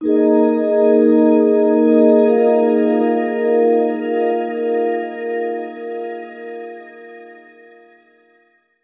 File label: Vibe Bell Drift; Sonic Foundry (Sony Creative Software); James Johnson Spektral Minimalism; Sublime Keys